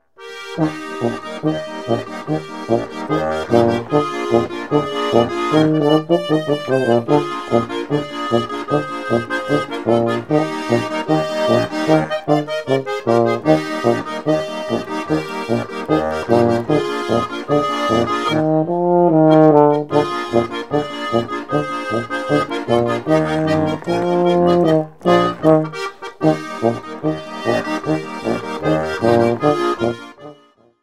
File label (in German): pinzgauer polka